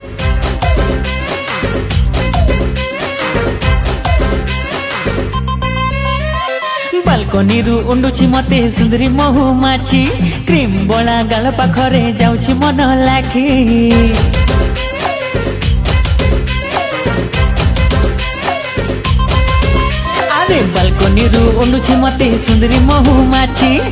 New Song New Dance Song Odia, Sambalpuri Album Ringtone; Odia Dance Video

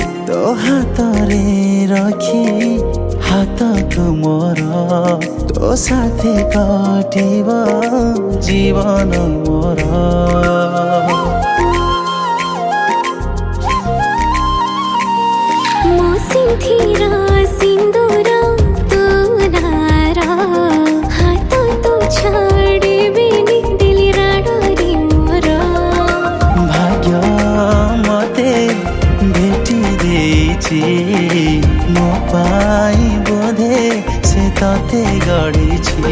New Odia Song

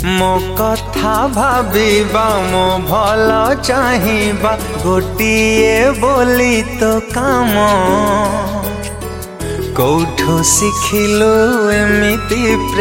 New Odia Romantic Song

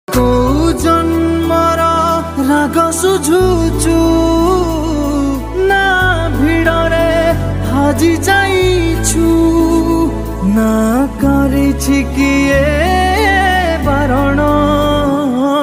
New Odia Sad

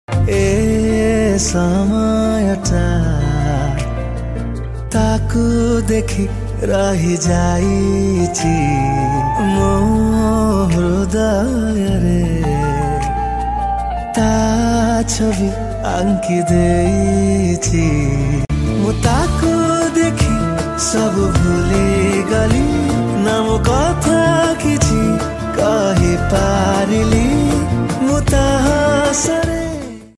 New Odia Romantic

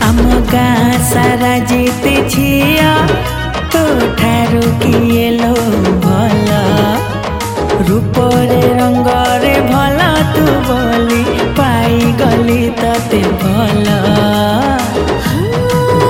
New Romantic

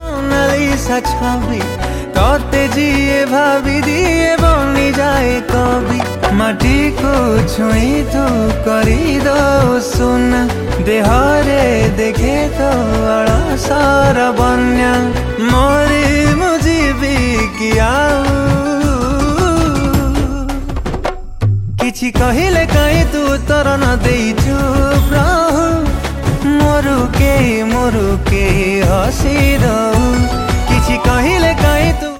Odia Song

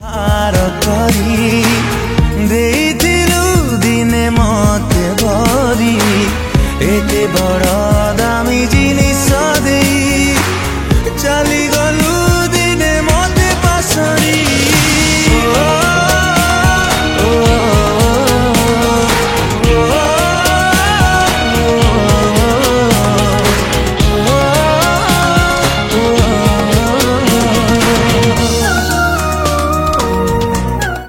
Odia Sad Song

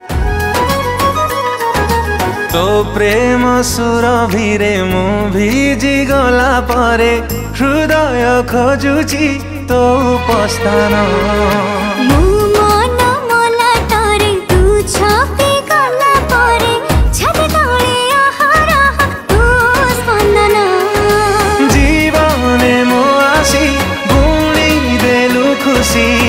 New Romantic Song

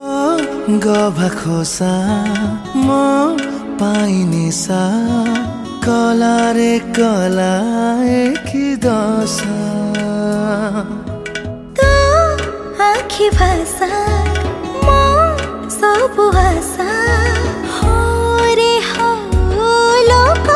Odia Romantic Song

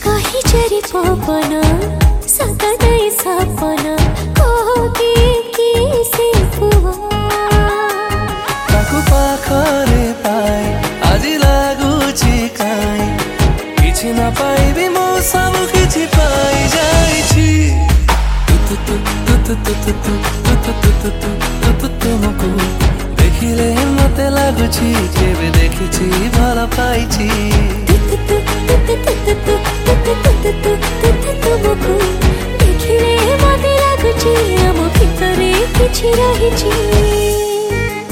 New Odia Romantic Song

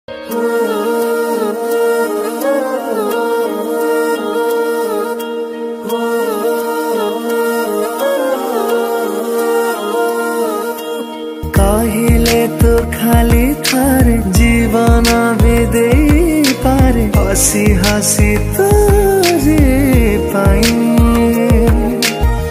New Odia Romantic

